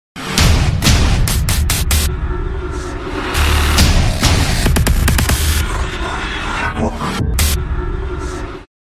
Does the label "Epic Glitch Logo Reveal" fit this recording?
Yes